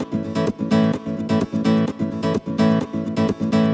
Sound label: Nylon Guitar A maj, 128BPM; Vengeance Samples; VEH3 Nylon Guitar Kit 2